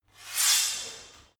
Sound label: sword draw